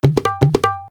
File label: Drumbeat, notifications; Coolpad; Tiptop-N2M